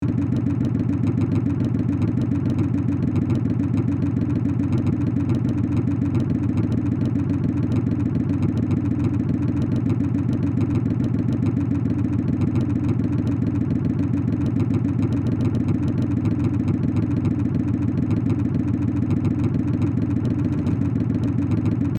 Harley davidson motorcycle exhaust perspective loop